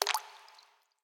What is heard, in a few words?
gem Splash; imperialriches